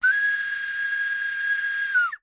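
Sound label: musical whistle wooden blow single note long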